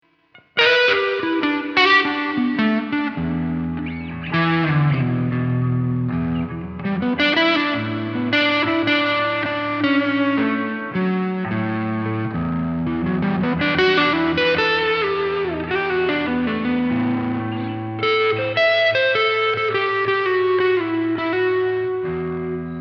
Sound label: Reverb Overdrive